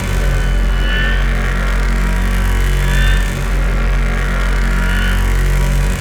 Mega Beam Loop; Warframe; Wisp

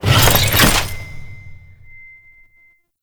Customization UI Equip; Veilbreaker; Kahl

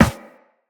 Operator Run Metal, Footsteps; Characters

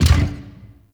Gear Shift Down, Motorcycle; Warframe; Vehicles; Motorcycle Ninety Nine

